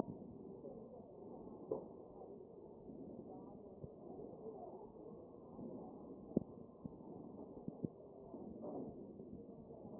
chest

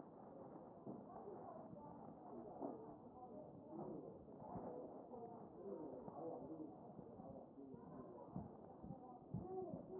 A CHEST

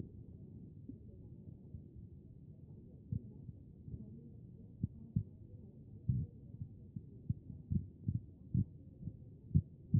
chest; B MODE

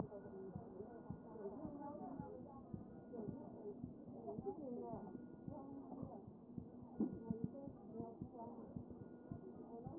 CHEST pos4 D MODE

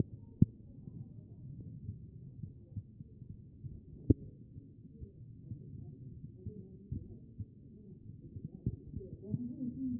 CHEST pos4 B MODE

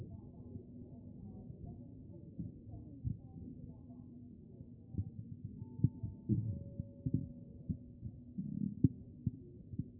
A CHEST; B MODE

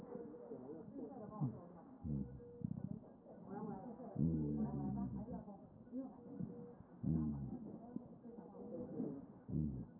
CHEST

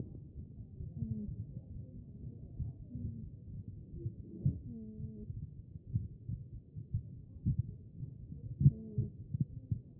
CHEST; B MODE

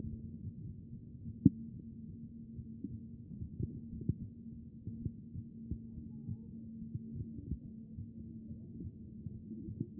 A CHEST; B MODE

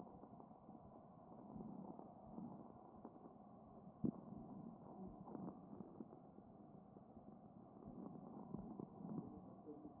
CHEST

